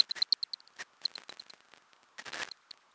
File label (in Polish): Karlik większy